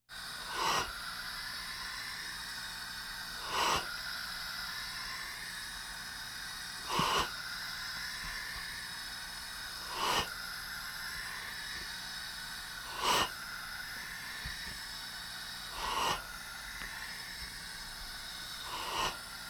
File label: Hospital effects